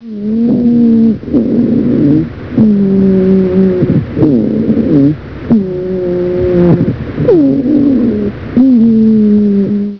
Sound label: breath